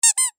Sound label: toy